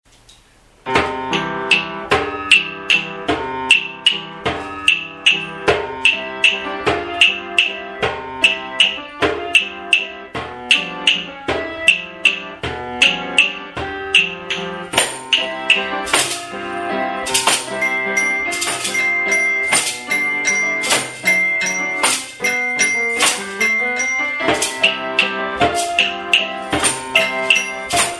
Waltz from Swan Lake, Rhythm and Drumming Ensemble